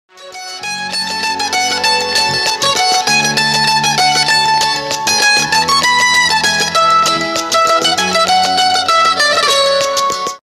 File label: old bengali instrumental ringtone